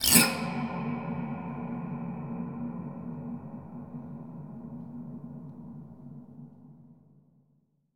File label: swipe strings; Cinematic Metal Impacts